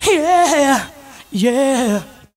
yeah yeah sung; ooh ahh; voice

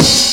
Cymbals

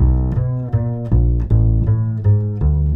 bass, Loops

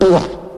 fart short

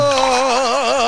"ooh", voice

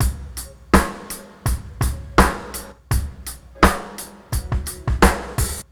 Drum loops, Boombap

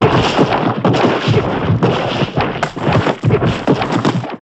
martial arts

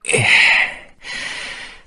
breath male; ooh ahh

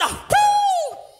uh hoo; ooh ahh; ho hoo woo

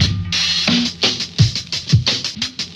metal barrel, Boombap Drum loops

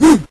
"woo" robot, voice; ooh ahh; ho hoo woo

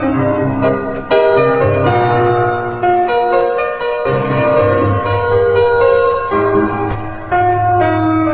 piano starts and stops, sad loop; Loops

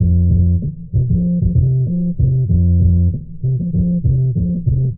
bass, Loops, Phonky

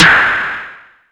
burst, zaps and beeps

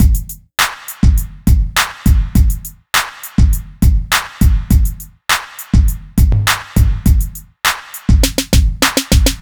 go go, Drum loops Boombap

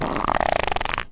fart medium

